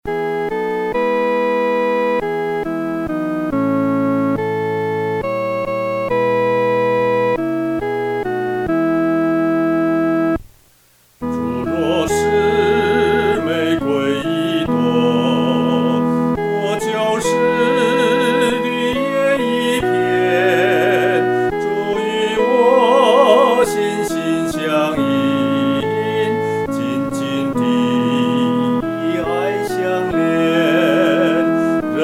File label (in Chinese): 独唱（第一声）; 主若是